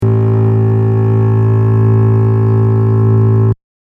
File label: A bass; tuneguide